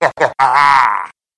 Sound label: monster deranged monk